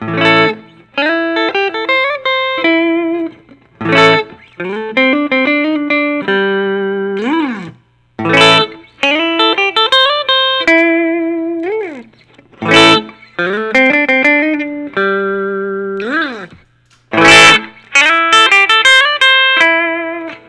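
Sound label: Gibson Custom chambered 59 Les Paul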